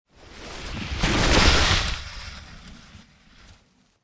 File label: Skiing Snowboarding Downhill Slide By Fast